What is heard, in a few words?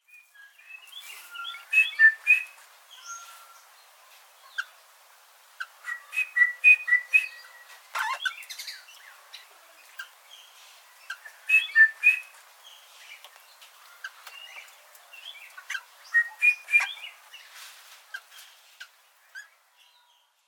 Eastern Rosella PLATYCERCUS EXIMIUS; EXOTIC BIRDS